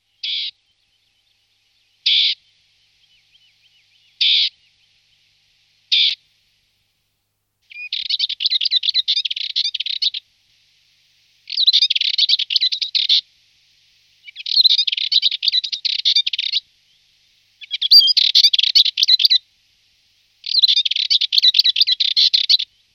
pěnice kaštanová

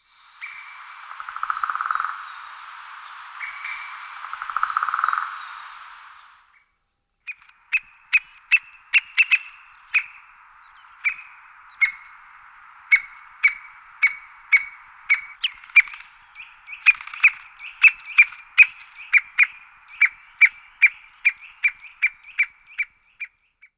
Strakapoud belohrbety Dendrocopos leucotos

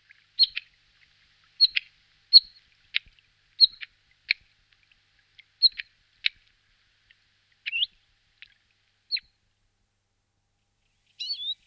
Slavik modracek Luscinia svecica

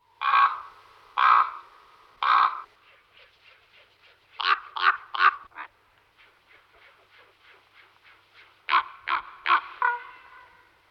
Krkavec velky Corvus corax

